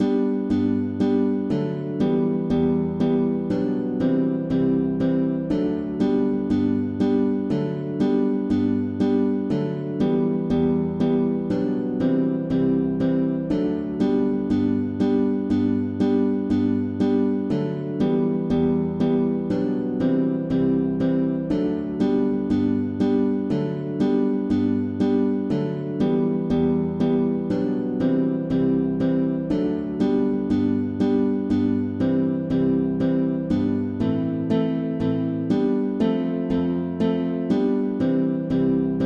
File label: Aria di Firenze arpeggiata, MIDI